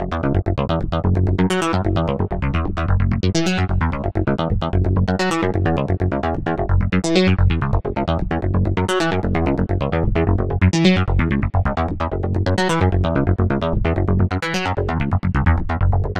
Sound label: digi vocal; porphyra hybrid by ocean swift synthesis